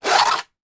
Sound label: toy sword plastic remove from sheath; sound effects one